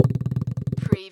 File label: CARTOON FEET